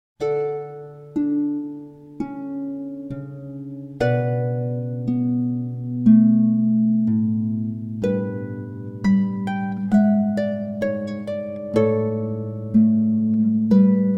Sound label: Aire G String